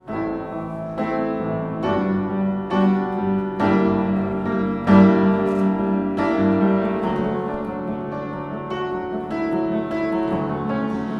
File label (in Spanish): Loops mutantes piano cama